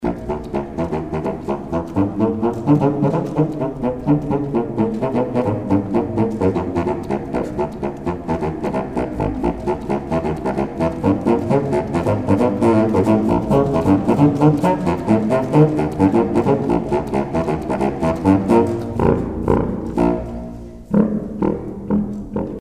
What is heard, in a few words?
contrabassoon; INSTRUMENTOS MUSICAIS